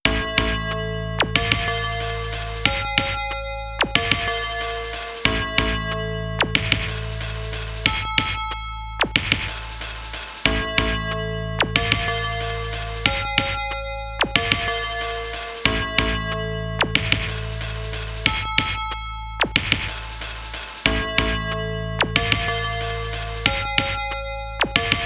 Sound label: Something Loopy FM Synth